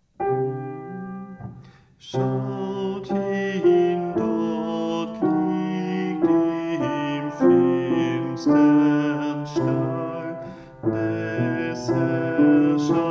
Schaut hin Alt

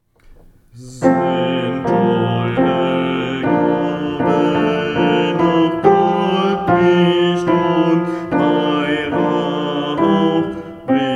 Wiegenlied, adventsmusik alt

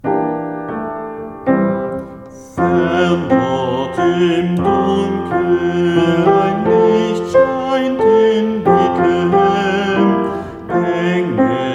Wiegenlied Tenor, adventsmusik tenor

